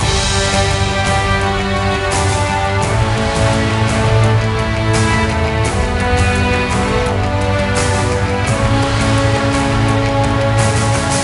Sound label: Menu; percy jackson and the olympians the lightning thief 2010 nds gamerip